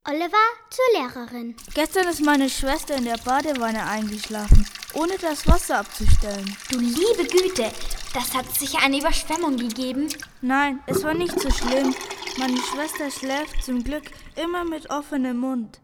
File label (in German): badewanne